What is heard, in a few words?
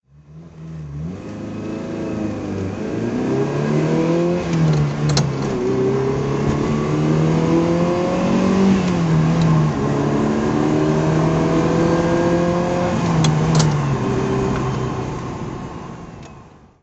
ferrari 238gts QV drive